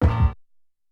Funky Fork

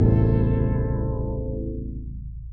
Synth

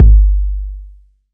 Not Nice 808's

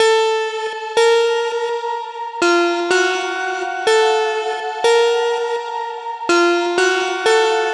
melody lead 124 F

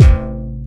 BD, 808s

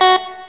FX

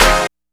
Club

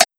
Perc

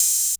Open Hats

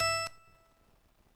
Keyz; Game

